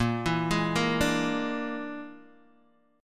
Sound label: Bb 7th Flat 5th Bb, Guitar Open D